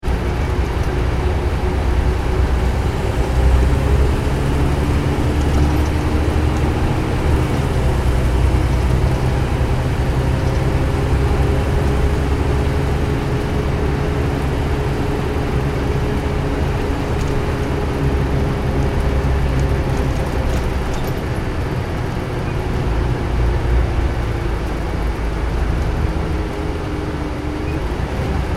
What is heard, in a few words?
transportation bus driving freeway internal; Bus Driving On Freeway - Internal Recording; Busses; Vehicles; McKinney Sound